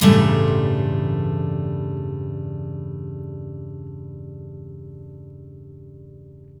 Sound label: BARI CHORDS, Blues Guitar; ILIO; Fretworks